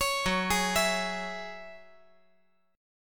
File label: F sharp 7th Suspended 2nd F sharp down; F#7sus2 arpeggiated